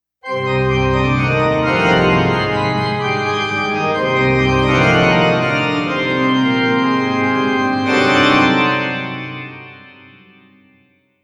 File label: Pipe Organ; luigis mansion dark moon; 3ds switch; Bully